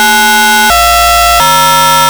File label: tone code